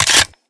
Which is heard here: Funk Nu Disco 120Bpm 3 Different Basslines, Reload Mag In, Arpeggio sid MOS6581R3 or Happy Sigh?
Reload Mag In